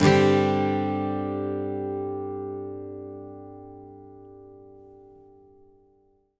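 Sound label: acoustic guitar G sus down, Whole Lotta Country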